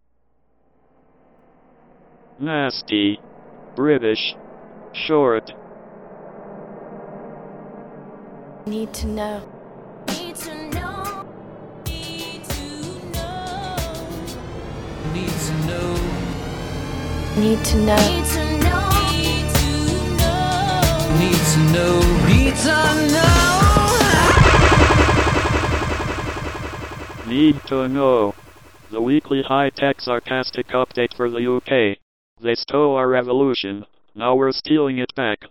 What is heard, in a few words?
jingle